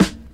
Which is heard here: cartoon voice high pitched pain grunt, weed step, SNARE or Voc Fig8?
SNARE